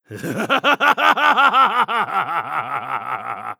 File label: Crossbowman Attack